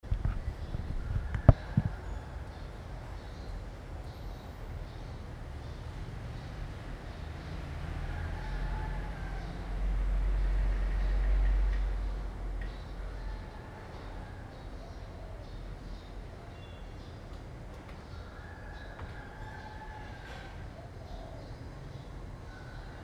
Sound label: ambient streets, phnom penh; 2019; Cambodia phnom penh